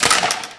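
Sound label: physics clipboard impact small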